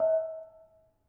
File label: Bonang Panerus soft, gamelan; gravityharps; timbrebox